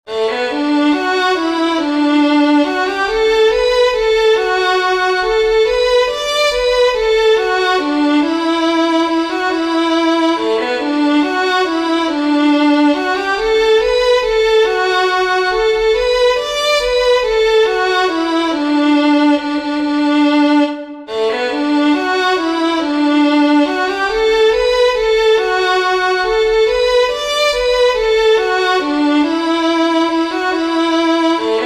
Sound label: WALTZ, fiddlers; southern kings fiddlers